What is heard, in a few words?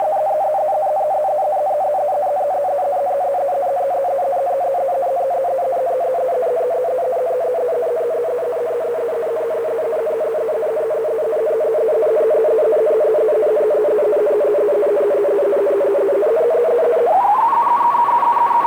digital noise, transmission